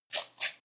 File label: scissors cut paper